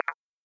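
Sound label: knock with computer sound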